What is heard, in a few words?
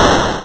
explosion, 8 Bit Videogame; LO FI and 8 BIT KITS